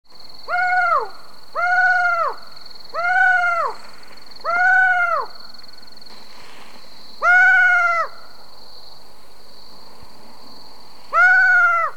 ninox connivens

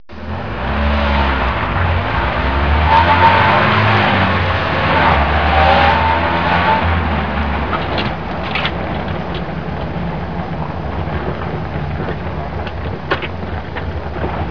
trucks

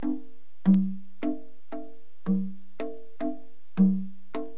musical

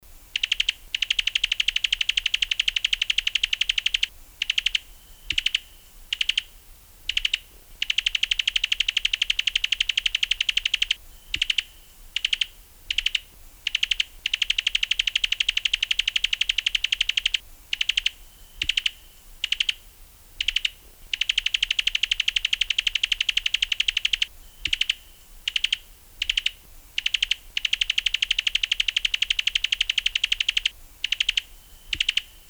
cuban tody

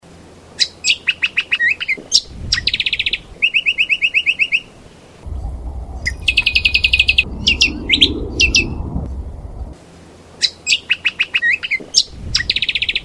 audio lichmera indistincta

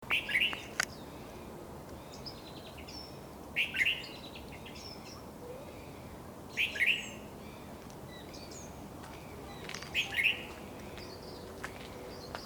red vented bulbul sound

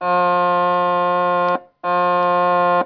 nautical

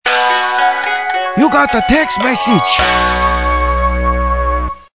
chinese text message

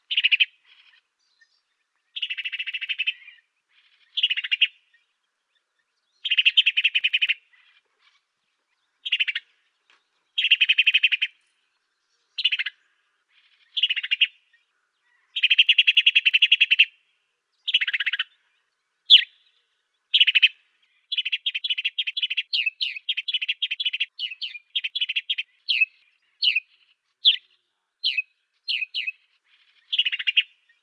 swainson sparrow

